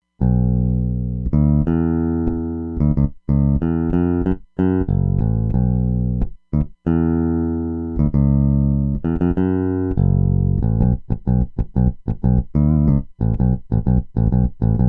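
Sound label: Picked Bass, Roland Fantom XR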